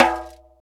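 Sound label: R8 shaker, AFRICAN PERC; Roland - LA Composer vol. 1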